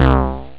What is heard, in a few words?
Bass; SynthSamples2